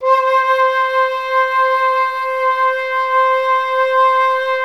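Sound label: flute legato forte C4, Orchestra; Best Service; Peter Siedlaczek's Advanced Orchestra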